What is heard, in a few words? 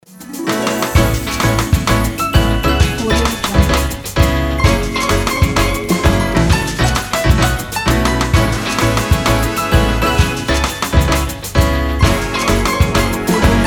jazz christmas logo